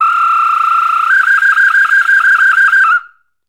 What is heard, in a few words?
OCARINA, World Whistles